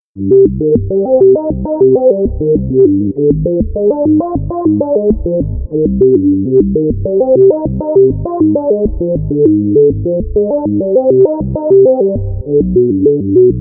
Bass

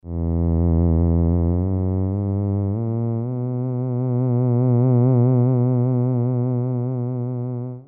theremin; ETHERWAVE KIT

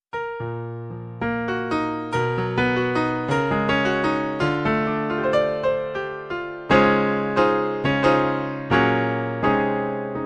Grand Piano

